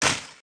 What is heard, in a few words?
breaking; breaking falling hit sfx